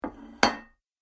Large Dinner Plate Slide Over Table Then Sharp Hit; Dishware; Household